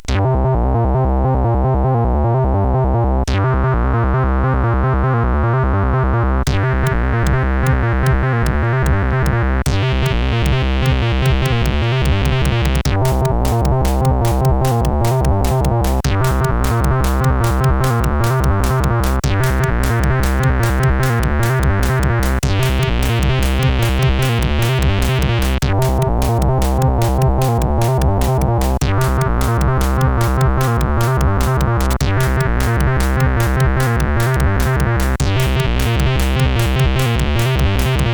Industrial, CSG8580R5

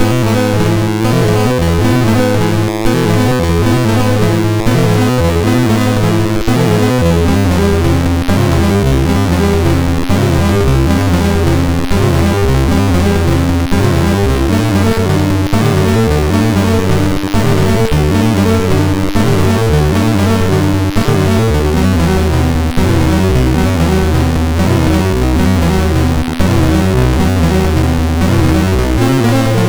Randomly generated music BASIC, sid MOS6581R2

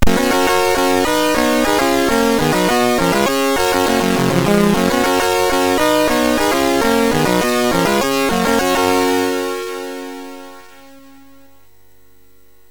Super Cup Football, MOS6581R3; hvsc

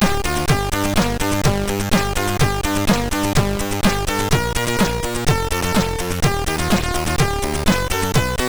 MOS6581R3; sid

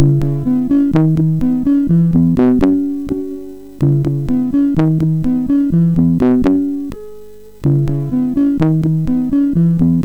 Piano, sid MOS6581R4; hvsc

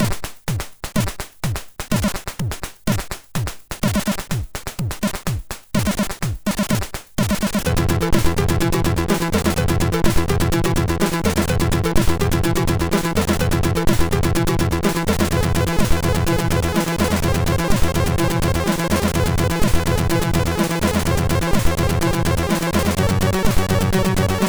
Short Techno sid MOS6581

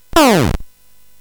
Frantic, CSG8580R5

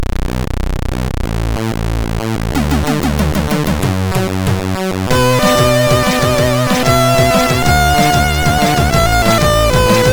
Jazz, sid, MOS6581R4

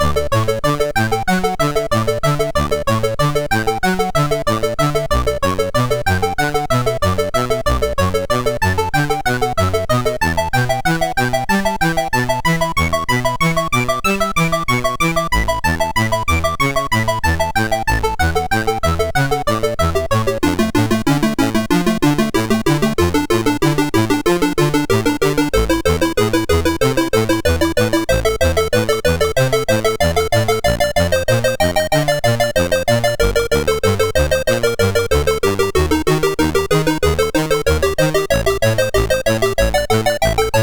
Synth Sample, MOS6581R3; Final Synth Sample II

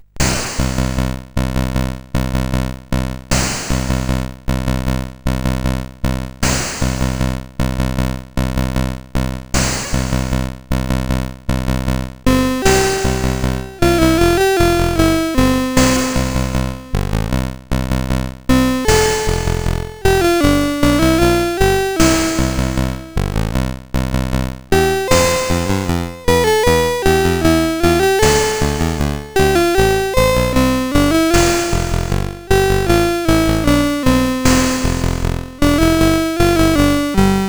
Cover Music, sid CSG8580R5; Master Composer

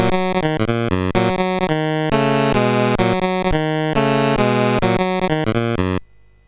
sid MOS6581R3

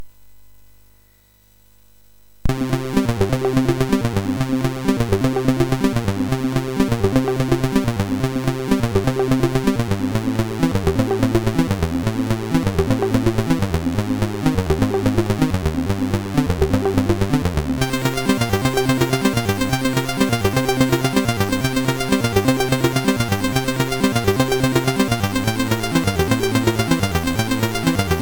Intro music, MOS6581R4; hvsc; MC

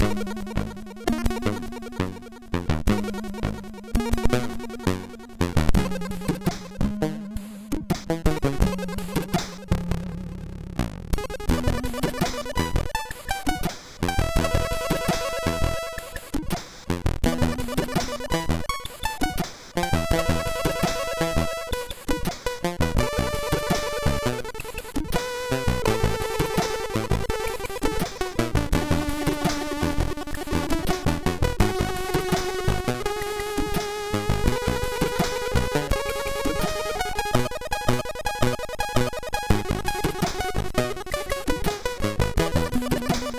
Acid, MOS6581R3

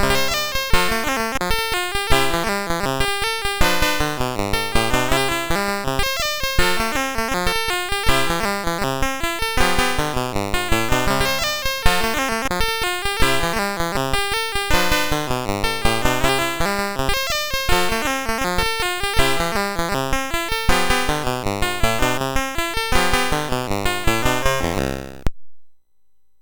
Blue Grass Music BASIC, MOS6581R2

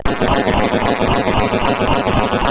Boing, CSG8580R5

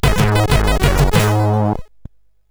Game Muzak, CSG8580R5; Moon

